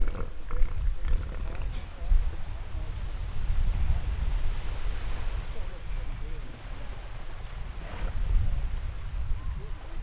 Seals mating; XY

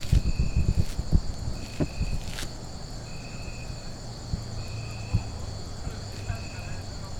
Zipolite Crickets; XY

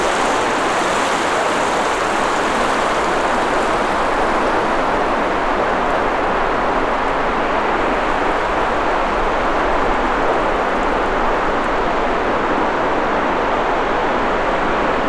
Playa Balandra Waves; XY; Calm Waves

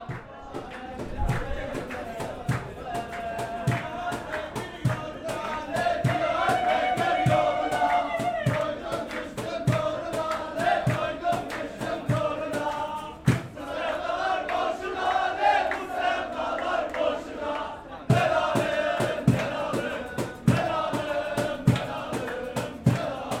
Istanbul Galata Kurdish song; Kurdish Belalin song

